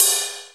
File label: ride cymbal a sharp key; tuned drums a sharp key